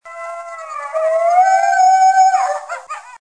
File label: Coyote Locator